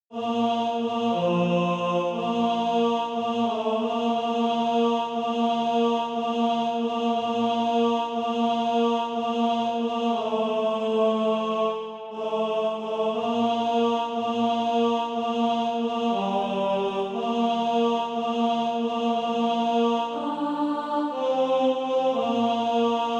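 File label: tenor, SATB Practise Music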